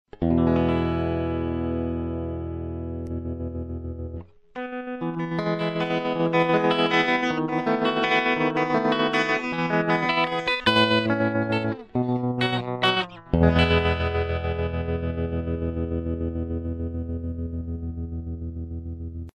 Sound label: stompbox tremulus; 4heads